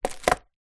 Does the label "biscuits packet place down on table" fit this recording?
Yes